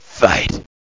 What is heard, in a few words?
Fight; Knockout